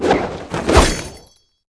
master attack

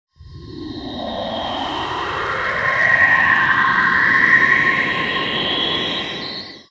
Into the Gate; Gamigo

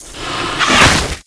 Snake In Attack; Seer; GamigoNA

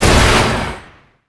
Skil Wield Ex

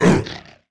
statue Dmg

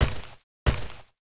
walk

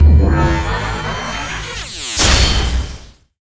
arena crystal base die

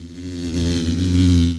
killer bee walk

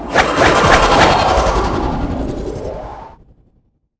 Final Sword Force; Seer; GamigoNA

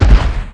Run; FAvanas; Seer; GamigoNA

